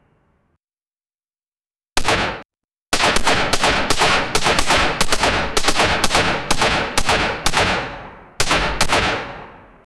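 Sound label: norse viking battlefield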